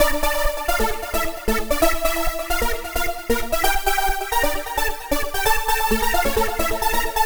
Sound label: Lead Synth